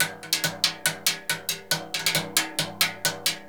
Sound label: CASCARA, Synclavier Percussion, World & Orchestral; ILIO; Synclavier Percussion 2